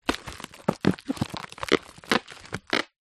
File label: frozen sausages packet bend to break sausages apart; zapsplat